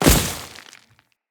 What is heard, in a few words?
Step Veg, Footsteps; Enemies; Grineer